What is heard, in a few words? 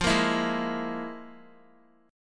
F Minor Major 7th Double Flat 5th Guitar; FmM7bb5 strummed; 4F,4A,5C,5G,5C,6E